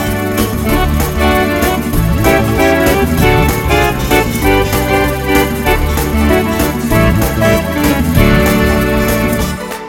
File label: Hot and Fast